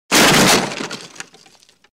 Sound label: breaking wood; شکستن چوب